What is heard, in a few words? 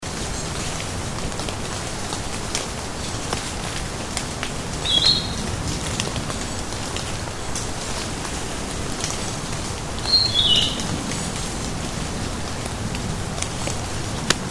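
kibitaki, narcissus flycatcher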